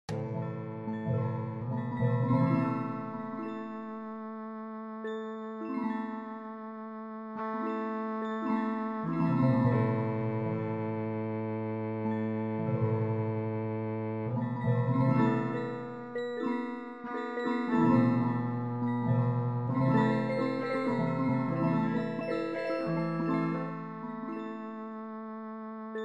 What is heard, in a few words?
modern pelog scale cello music box and perc; fractal music; Sloth canons